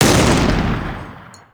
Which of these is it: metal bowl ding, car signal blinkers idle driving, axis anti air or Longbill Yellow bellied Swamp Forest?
axis anti air